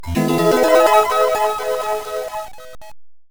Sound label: High Score Table; super puzzle fighter ii turbo; gba